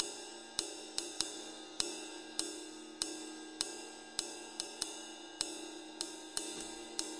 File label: six eight Loop, Six-Eight Loops 100 to 105 bpm, DRUM LOOPS and BREAKS